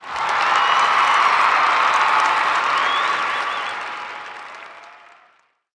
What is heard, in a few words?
crowd cheer; Ringling Bros. and Barnum & Bailey; Wii